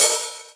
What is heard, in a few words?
Open Hihat